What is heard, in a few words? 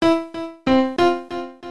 piano